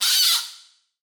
monster

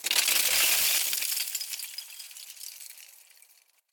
magic ice frozen

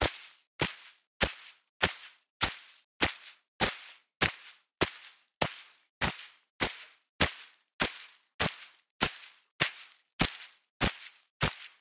walk lawn

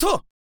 swordman attack

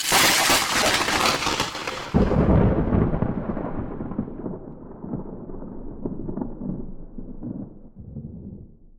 thunder

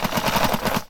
vacuum cleaner cord pull

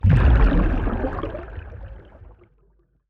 diving underwater

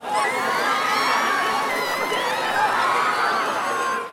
run away people

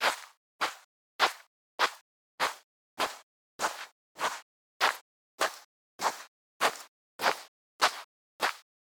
walk sand

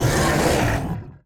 goblin cry, battle

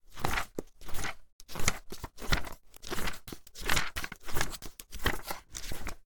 page

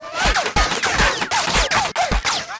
bullets bounce, battle

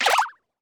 sit

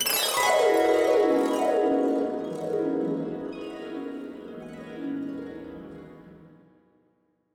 shine

